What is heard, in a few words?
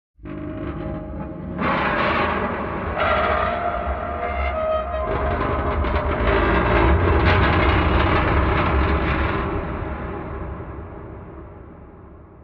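Large Object Movement, boat creaks & bangs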